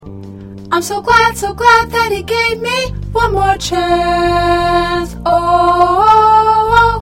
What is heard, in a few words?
Alto, choir parts